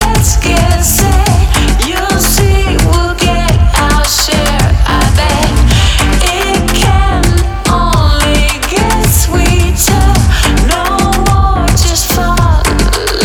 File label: Dancehall Remix